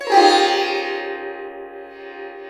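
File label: sitar glissando; Spectrasonics; Heart of Asia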